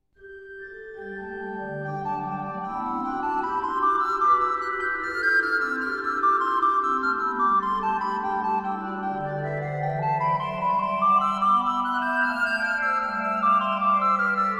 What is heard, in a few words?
Partita Over Herre Gud